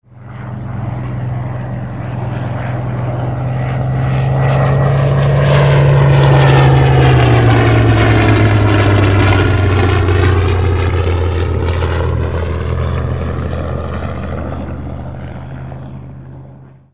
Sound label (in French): bf109 passage